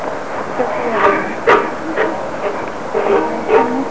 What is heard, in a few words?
banjo